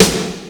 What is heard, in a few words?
crisp snare drum sound b key; Duration 0:01; Samplerate 44100 Hz; Channels Mono; snare drum samples